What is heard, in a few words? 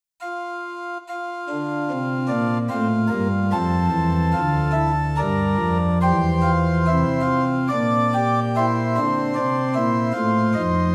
Organ Pitch 440 Temperament Equal; Rohr F 8; Prinz 8 4; hymn